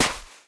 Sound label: step sand